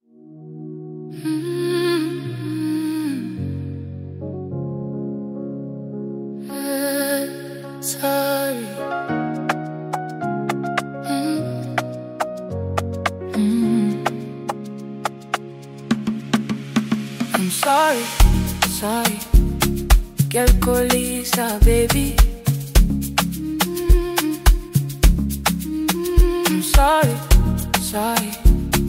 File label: Afrosoul vs Soulful Amapiano Saxophone